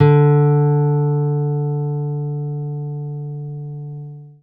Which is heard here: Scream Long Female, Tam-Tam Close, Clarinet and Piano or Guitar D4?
Guitar D4